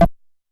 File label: Perc Hi, Stylophone Beatbox